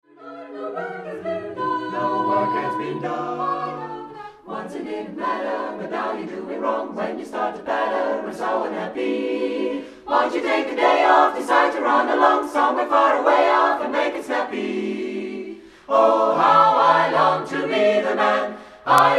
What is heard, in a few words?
ssatb